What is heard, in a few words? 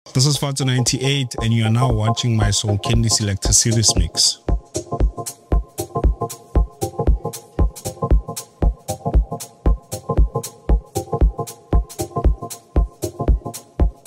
Deep House Mix